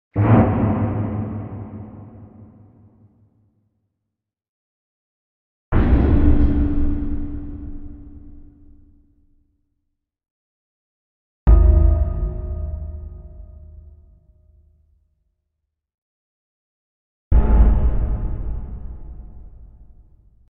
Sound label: Bass impact - Tonal bell x4; Hollywood Edge Sound Designers Tool Kit